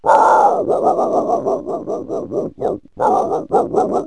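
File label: hungry dog; animals; Wired for Sound Pro CD; Aristosoft, Inc.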